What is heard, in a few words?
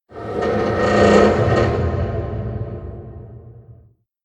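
Random Ambience; moul; Eng Hut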